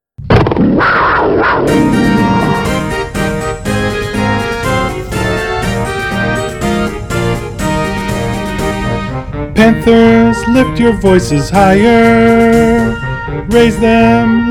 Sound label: Eb major school song with male vocal; Parkside School Song